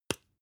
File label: spice jar small open short